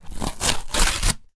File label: Cardboard Tear